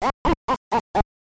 Opponent Laughing Out Loud; Mike Tyson's Punch-Out!!

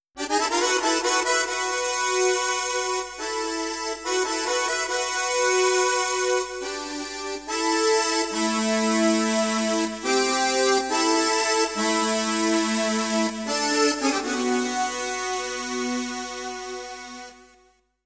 (Harmonica); Ys Ys II Eternal

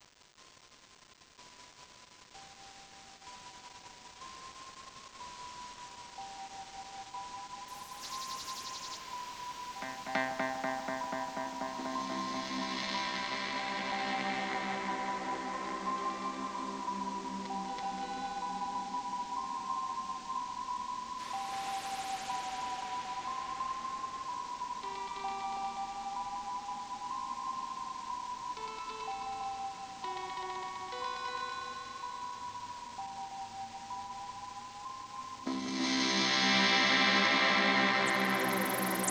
Temple; Soundscapes for Hypnos

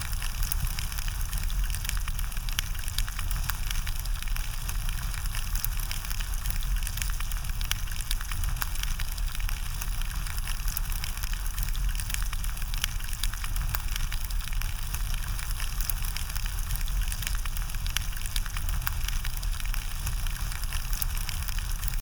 torch

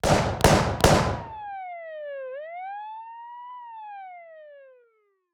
Cops Dead

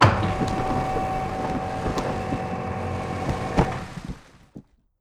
open hidden door

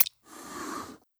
popup pos close

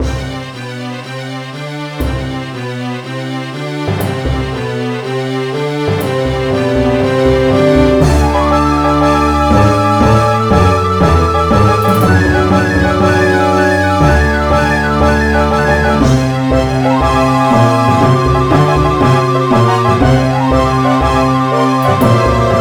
Dungeon Epic; Crystal Monsters; Monster Chronicle; DSiWare gamerip

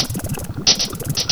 Save Station Saving

